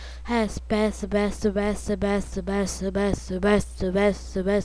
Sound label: breath